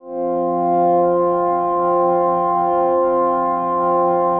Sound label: CHRD PAD; Best Service; XX Large Pads; Sample CDs